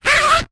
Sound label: sport badminton racket cover sleeve zipper; zapsplat